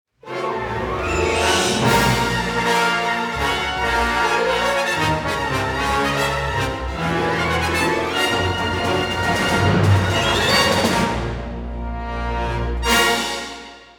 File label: Battles Imperial Lose; Hoth